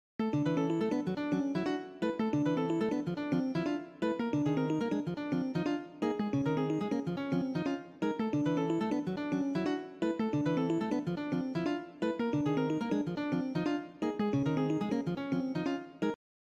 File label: cont rhythm example